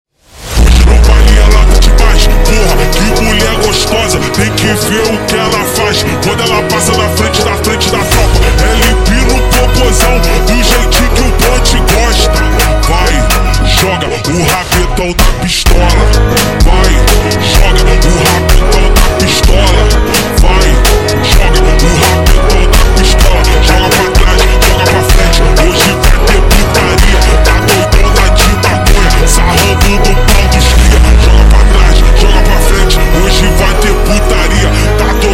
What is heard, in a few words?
Brega ultra Slowed, funk